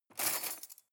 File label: cutlery cake forks drawer grab hard